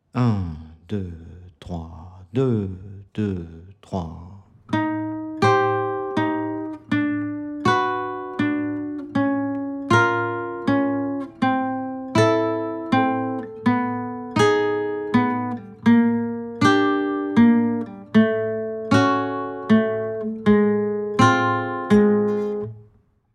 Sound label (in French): exercice petit barre sur 3 cordes exercice b 080bpm